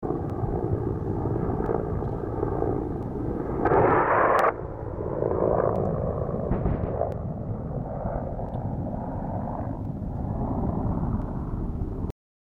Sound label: mi35 rocket